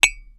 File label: China On China